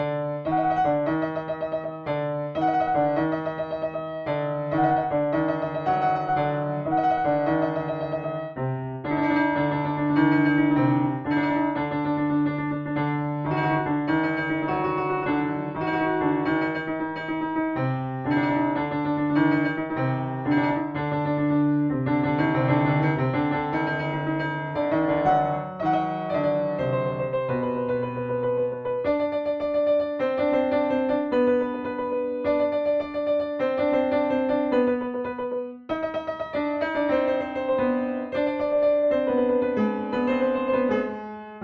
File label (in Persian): Naghmeh Maghlub, piano; Chahargah; Radif Mahjoubi